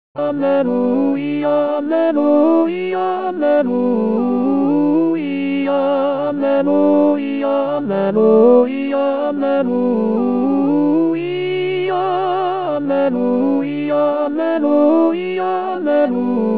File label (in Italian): alleluia ritornello